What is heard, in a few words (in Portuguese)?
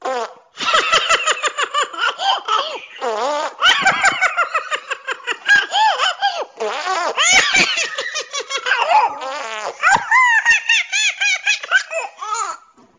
funny peido, toque de peido engracado; toques para celular